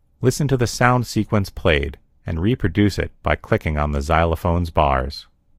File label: melody; gcompris